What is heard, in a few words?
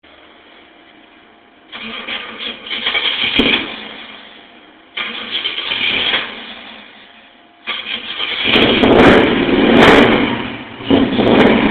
pontiac firebird